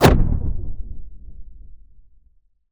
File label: death; rund um die welten